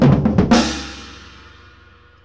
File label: tribal loop 120